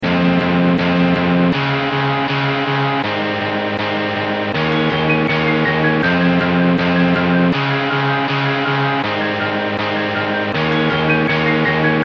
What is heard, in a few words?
Electric Guitar ONLY